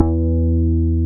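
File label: BAS MKS-50, bass, Roland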